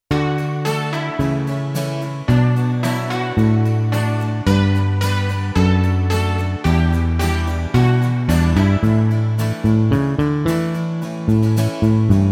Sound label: Karaoke